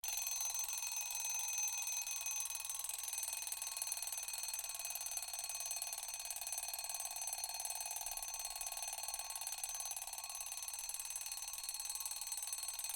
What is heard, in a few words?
mechanical clock ring